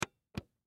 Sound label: Toy Cd Player Push, Toy Cd Player Push Button; Miscellaneous Cartoon; Foley; SoundEffects-Blastwave